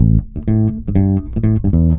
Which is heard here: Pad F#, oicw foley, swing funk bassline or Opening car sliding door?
swing funk bassline